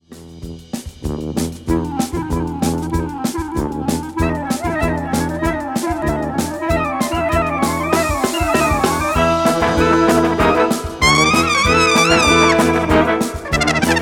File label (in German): Blaskapelle